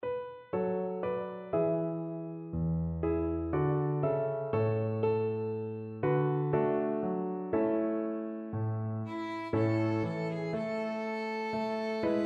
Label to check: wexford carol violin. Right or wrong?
Right